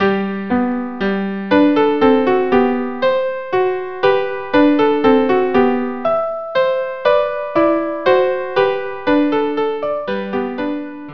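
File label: violin viola minuet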